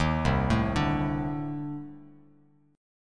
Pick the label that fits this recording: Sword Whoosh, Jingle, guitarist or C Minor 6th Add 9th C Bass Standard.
C Minor 6th Add 9th C Bass Standard